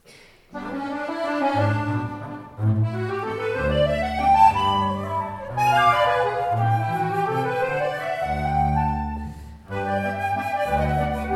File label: Musette